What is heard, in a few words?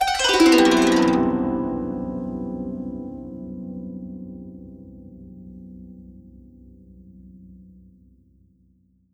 zheng, ZHENG HARP; World Instruments 2; STRINGED INSTRUMENTS